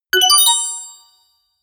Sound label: notification call; signale